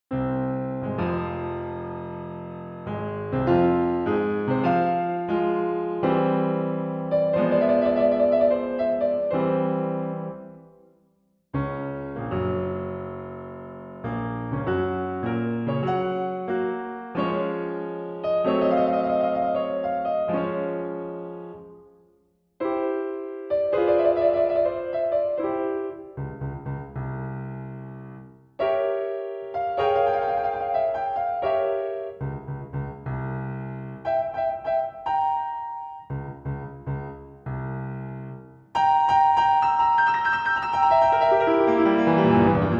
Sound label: Piano Sonata, Allegro Assai; Op. 57 Appassionata